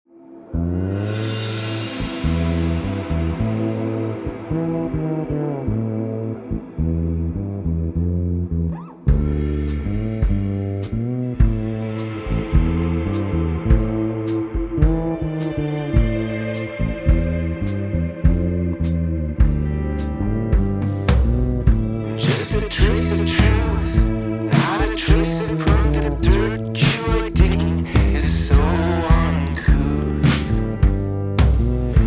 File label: Whistling song sample